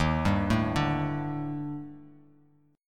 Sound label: Eb Major 7th Flat 5th, Bass Standard; EbM7b5; arpeggiated; JGuitar